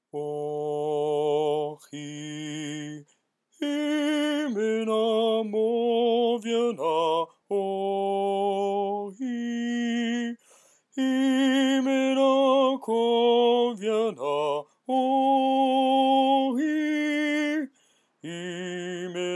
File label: morbheanna low, Folk Choir music